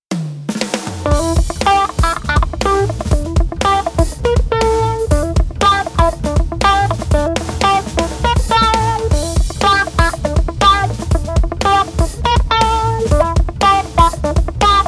funk

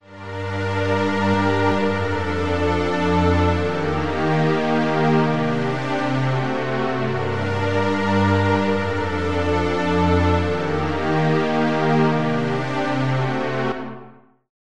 pad cinematic symphonia

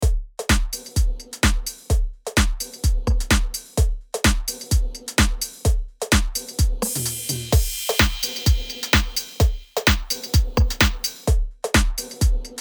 rich drums beats

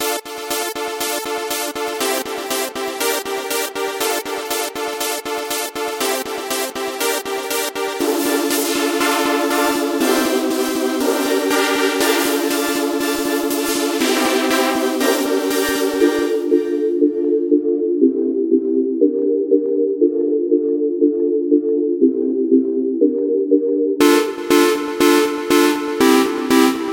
leads

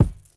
cash coin kick; nova sound

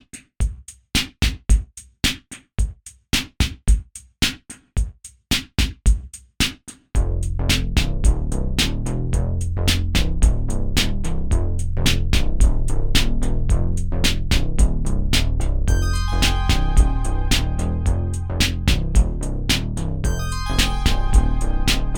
cr-78; huston singletary

